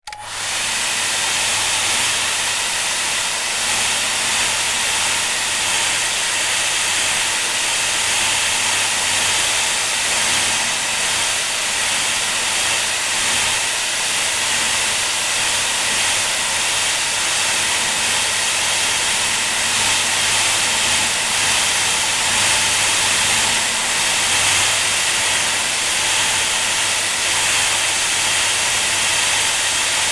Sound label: household hairdryer on operate off recorded rear fan